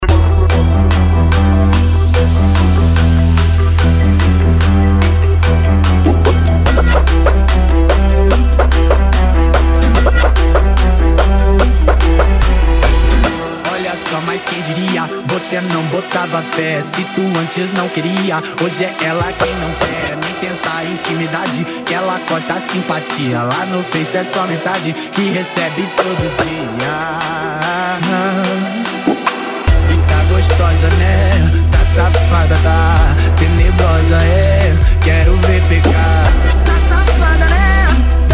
trap funk

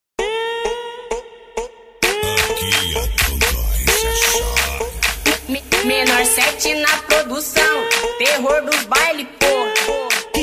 beat; barulha capital; cd corsa terrivel funk e piseiro

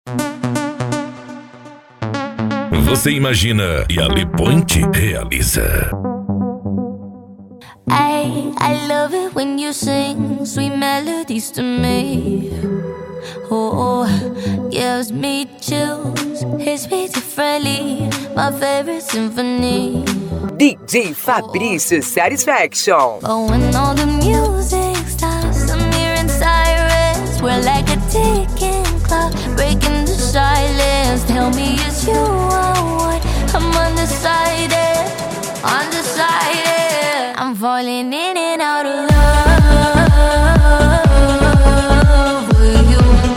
deep house

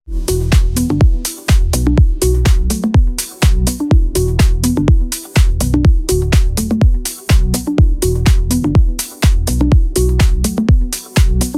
house

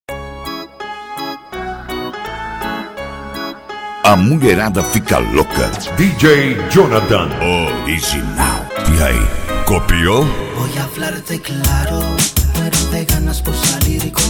reggaeton mix; reggaeton mix evolution

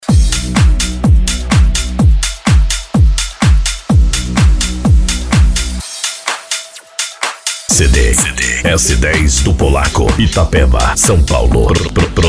electro funk

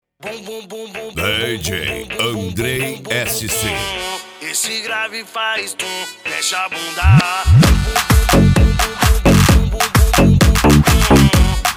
funk in beat

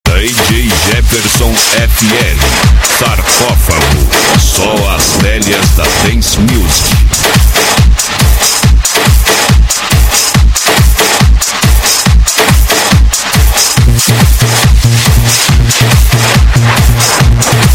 dance musik

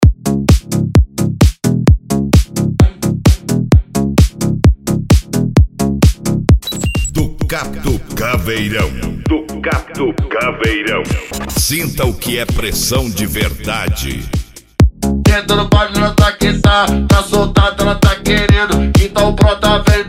especial mega funk

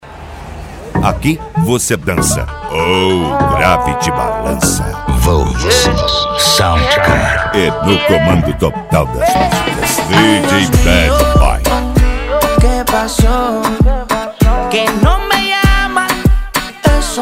sound car reggaeton; cd volks sound car

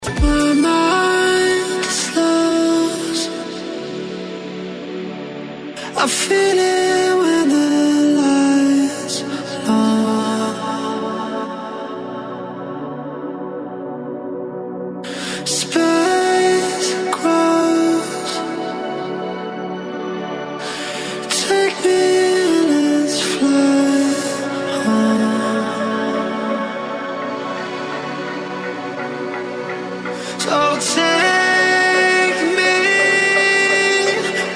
progressive eletronic music

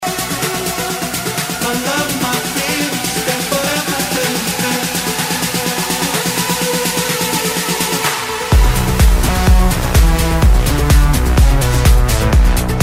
progressive techno house

